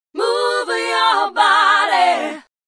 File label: style, African vocals sung